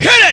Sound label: VOX; ZERO-G; Datafile